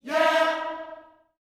"yeah", gospel vocal, E4; Spectrasonics; Vocal Planet Vol.1 Gospel; akai

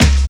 BD; E-Lab; X Static Goldmine 1

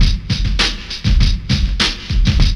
LOOP; E-Lab; X Static Goldmine 2

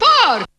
TOON

